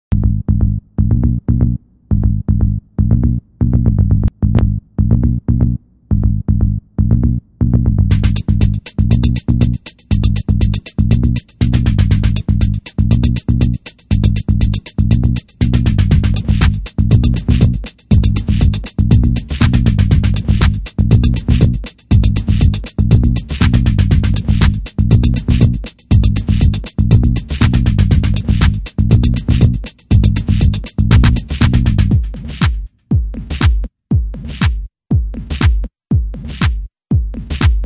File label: Instru Music